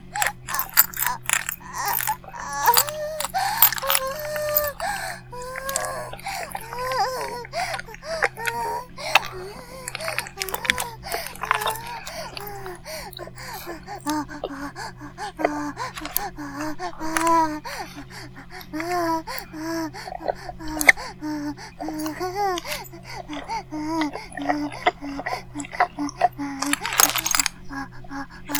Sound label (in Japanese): バイブ放置 おしっこ